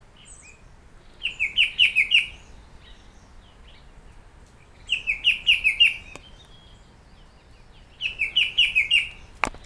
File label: shrike babbler blyth Pteruthius aeralatus